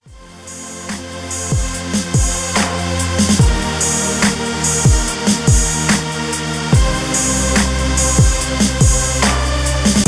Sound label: 72 BPM